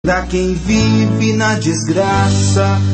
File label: rock billy musica